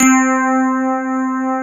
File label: FM ELP C3 L, Keyboards Old School; USB Soundscan vol.09; AKAI; FM ELP 8